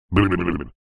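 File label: cartoon disgust sound shaking head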